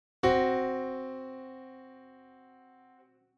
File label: Tritone interval